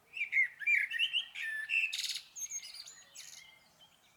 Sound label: bird, ambient